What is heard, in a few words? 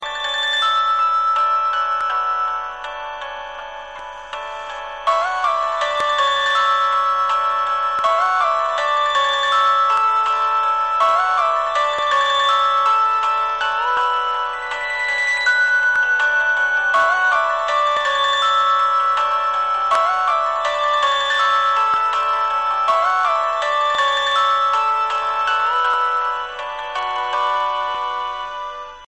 Caller Tune; Android